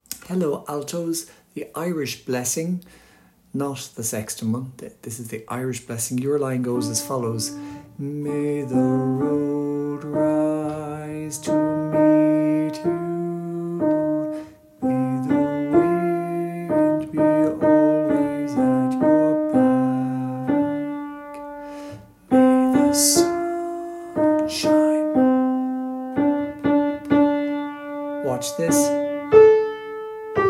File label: Alto